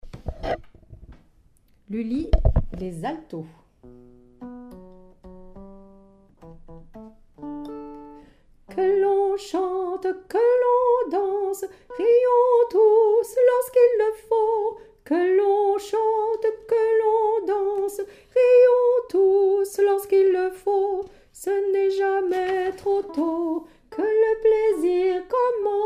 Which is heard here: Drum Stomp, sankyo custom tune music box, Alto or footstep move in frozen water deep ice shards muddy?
Alto